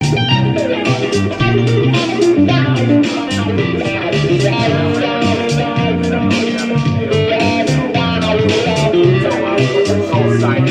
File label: Funk